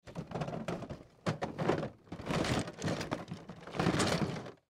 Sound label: Toy Chest Dig; Miscellaneous Cartoon; Foley